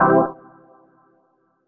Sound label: HOUSE, House Dance